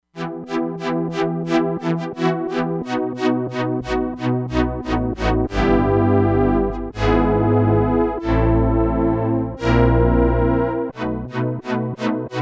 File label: BOWED STRINGS, Crumar Bit 99; Factory Programs